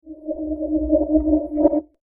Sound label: Poltergeist, ghost; Ghost Sound Effects; horror